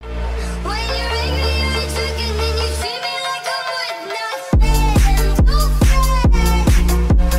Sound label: sigma music